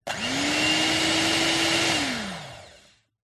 household kitchen electric whisk on off

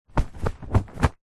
foley clothing shorts thick cotton shake

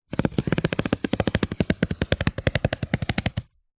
cardboard score cut slice with knife

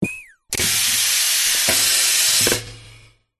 tek science fiction machine beep air release loud; Audio Mangler

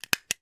office stapler stapling in air; sound effects one

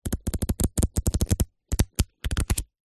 leisure cards game flick through; zapsplat

